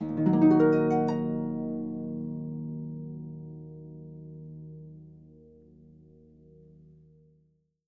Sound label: D#, Percussion & Harp; Miroslav Vitous